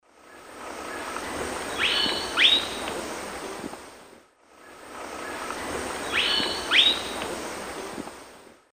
Philydor erythrocercum, call; S. America; Eastern Lowlands

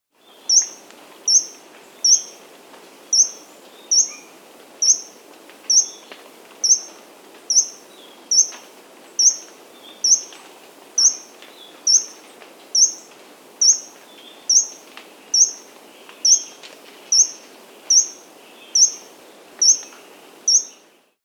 Bronzy Inca (Coeligena Coeligena), Andean Birds; S. America

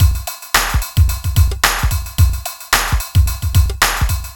r n b kit 110

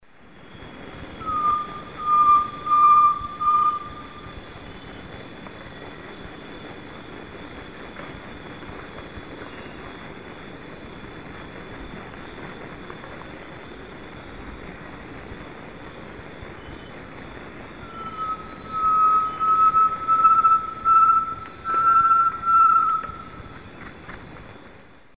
Tinamus major, song; S. America; Eastern Lowlands

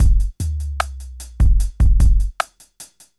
hiphop

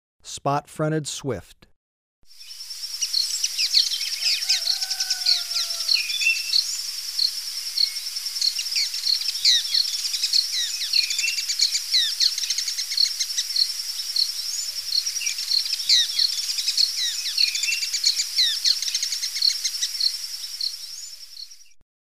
Spot-fronted Swift; S. America

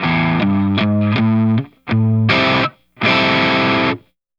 Guitar A min, Rock

